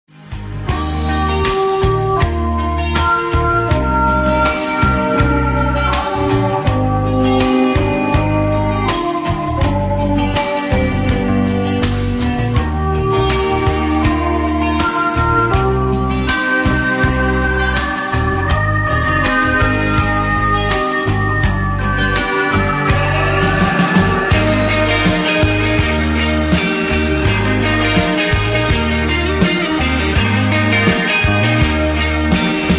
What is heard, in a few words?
instrumental